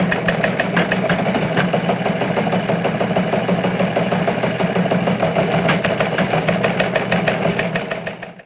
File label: Teletype